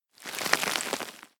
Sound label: rubble; player; Apotheorasis